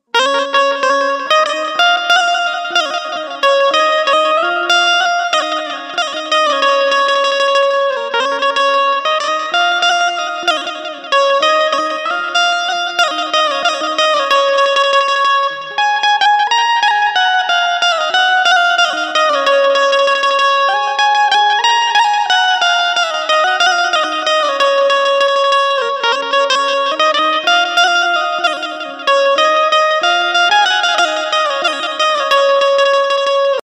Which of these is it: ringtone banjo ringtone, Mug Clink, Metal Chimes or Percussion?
ringtone banjo ringtone